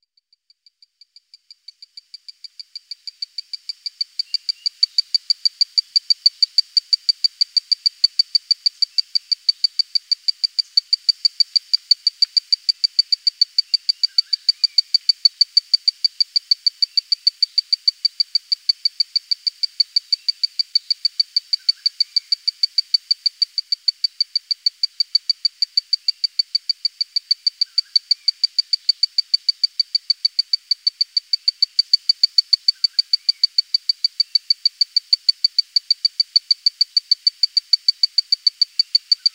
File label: Epipedobates hahneli